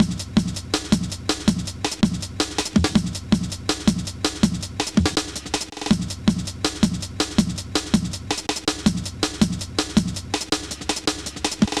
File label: drum, Drum & Bass; Best Service ProSamples vol.03 - Drum & Bass 1; AKAI